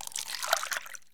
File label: Pour Juice Paper Cup; SFX Kit; Foley - Misc